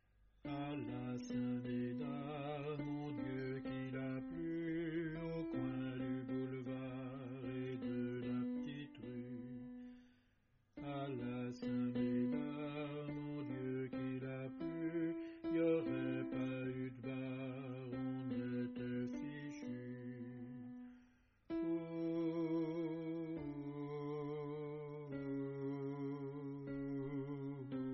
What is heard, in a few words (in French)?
st medard alto